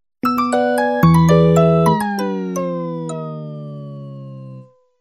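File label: nokia low battery ringtone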